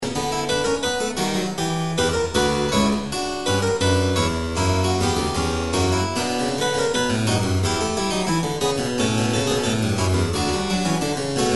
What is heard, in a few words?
well tempered clavier fugue c minor; bach